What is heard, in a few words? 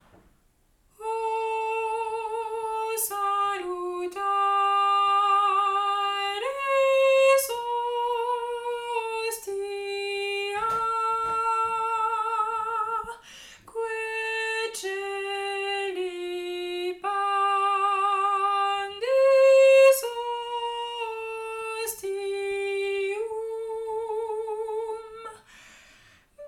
O salutaris soprano